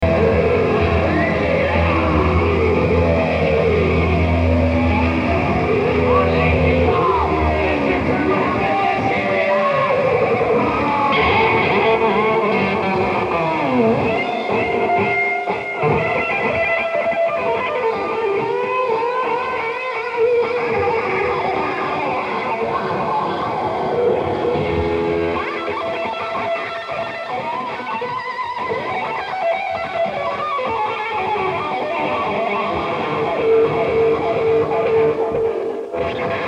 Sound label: Guitar Solo, Fan Recording Live; Fan Recording Live At Solnahallen, Stockholm, Sweden September 26th, 1986